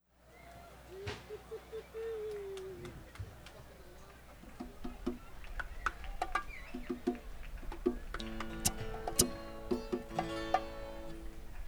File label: tuning; Bluegrass